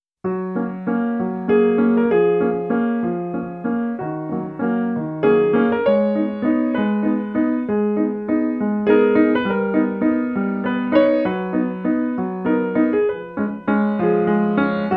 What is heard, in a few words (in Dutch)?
Walsje voor piano en speelgoedpiano; Bouwstenen van muziek